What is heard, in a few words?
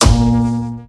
Character Hit